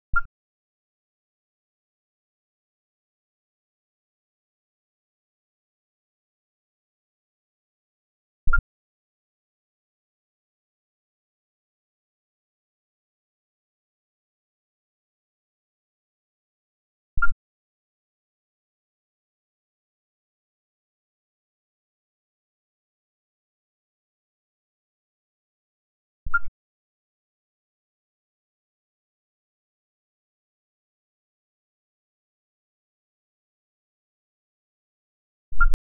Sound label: Pristimantis nicefori